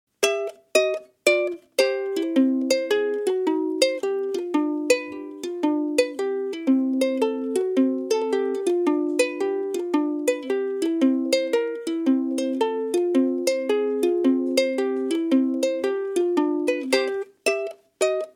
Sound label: Ragtime, ukulele